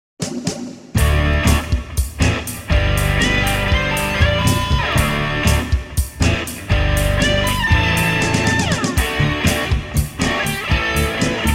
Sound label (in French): Hymne guitare long